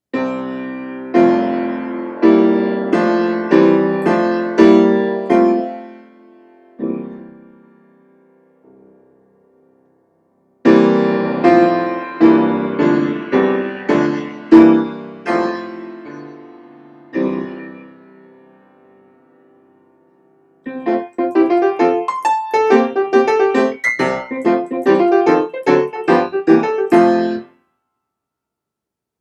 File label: improv F maj, piano improvs; Noam D. Elkies piano improvisations